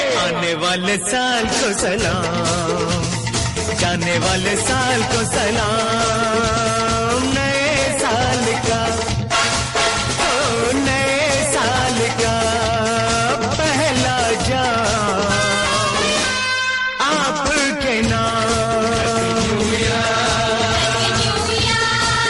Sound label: Happy New Year Song Ringtone